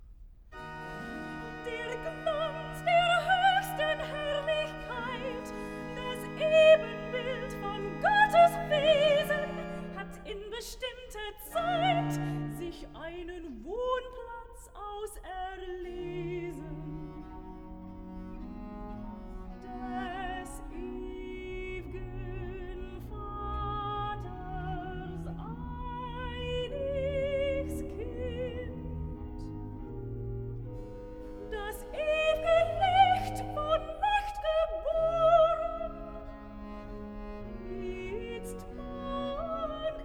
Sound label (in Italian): Recitativo e Choral (Sopran); Cantata, BWV 91 'Gelobet seist du, Jesu Christ'; Bach; New York; Xmas Day + 2nd Day of Xmas